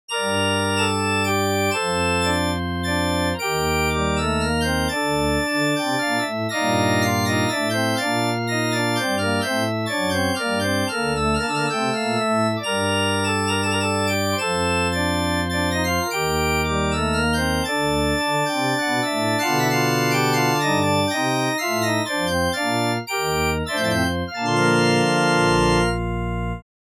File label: Church Organ C, Minuetto in g minor, dry; Andreas Instrument Sounds AB